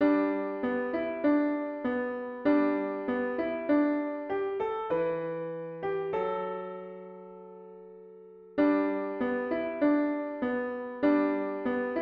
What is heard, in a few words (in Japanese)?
ピアノ